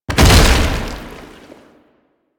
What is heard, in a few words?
Tank Infested Melee Impact; Enemies; Abilities; Warframe; Lasrian